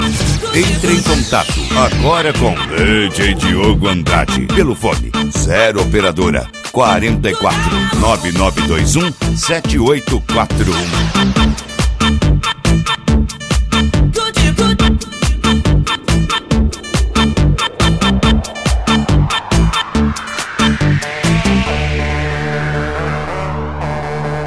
eletro funk

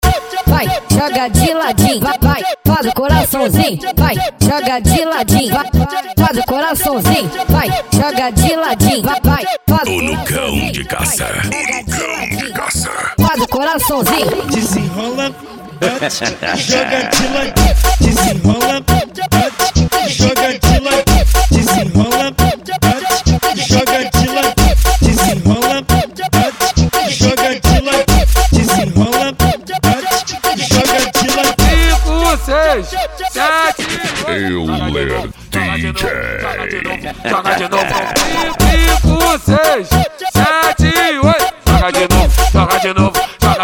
funk ritmado

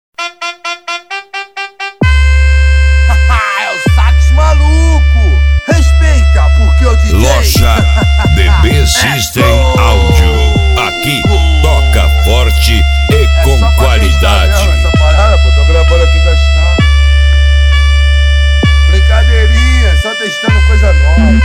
sax maluco

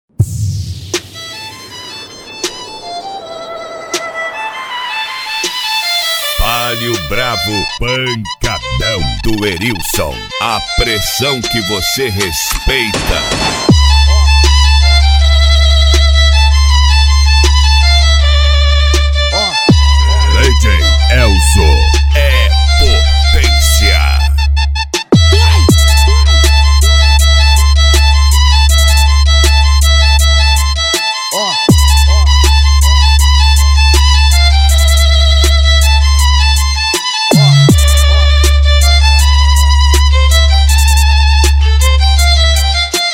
violino; palio bravo pancadao

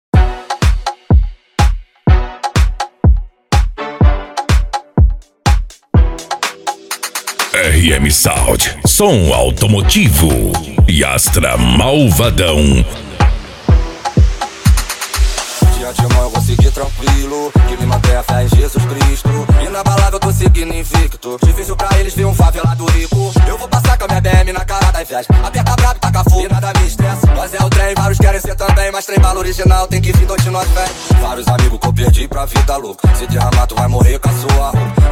som automotivo

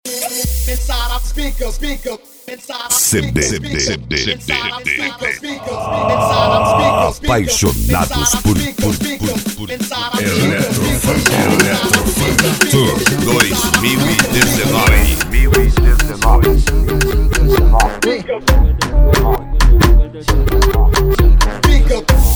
eletro funk

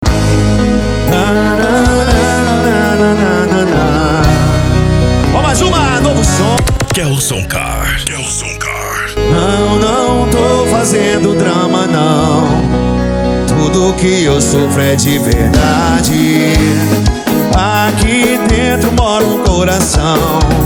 sertanejo e lambadao

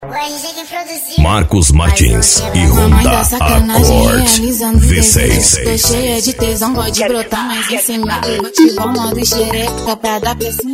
piseiro

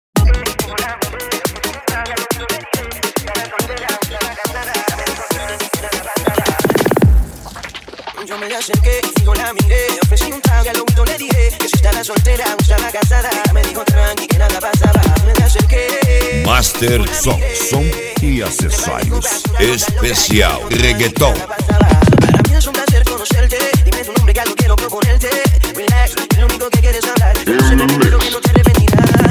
reggaeton

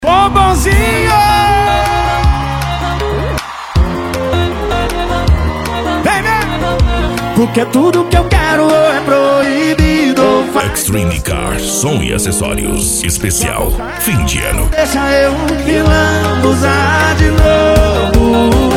piseiro e sertanejo

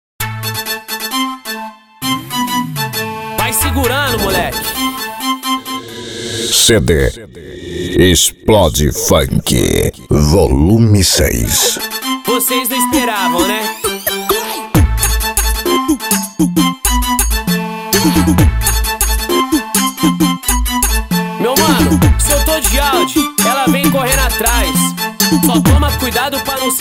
explode funk

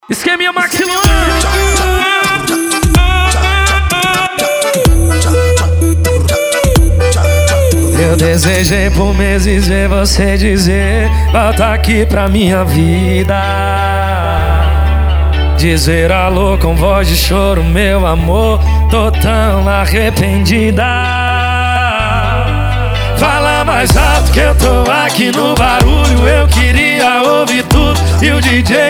sertanejo

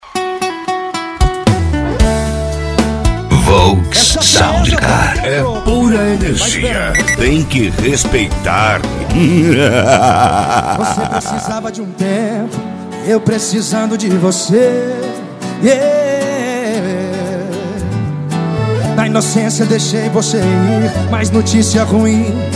sertanejo e pisadinha; cd volks sound car

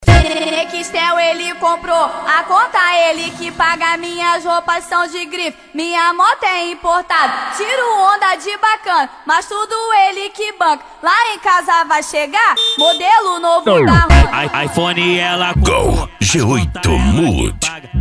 funk ritmado

